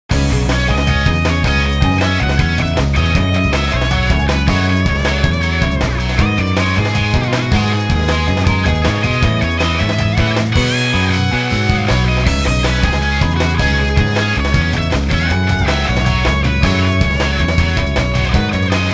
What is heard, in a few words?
Night Flight Instrumental; OutRun 2006 Coast 2 Coast